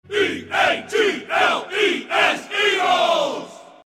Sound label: Eagle chant; eagles